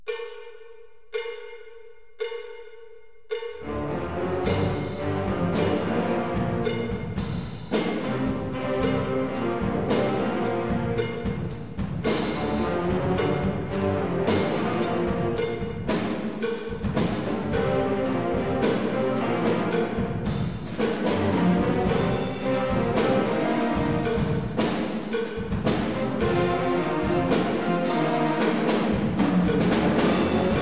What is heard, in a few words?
voices for solo clarinet and orchestra